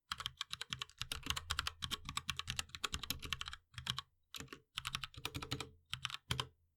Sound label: Typing On Mechanical Keyboard